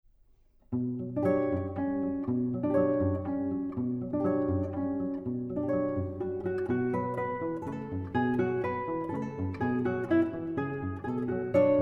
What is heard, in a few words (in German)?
Gitarren Duo